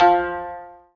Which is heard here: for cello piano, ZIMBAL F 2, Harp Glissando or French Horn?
ZIMBAL F 2